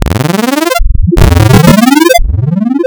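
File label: AM Simple Non Sine, wavetables, Amplitude Modulation; Architecture 32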